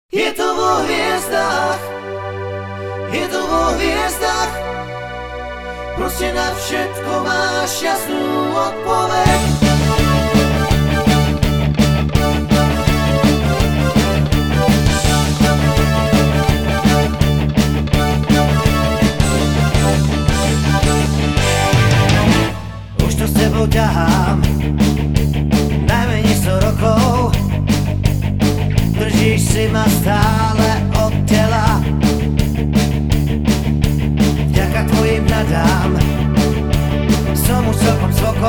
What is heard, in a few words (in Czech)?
hudba; broadway sumperk